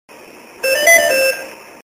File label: Hungary MAV Jingle